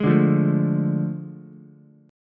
A Major 7th Suspended 4th Sharp 5th, Ukulele, chord; AM7sus4#5; strummed; JGuitar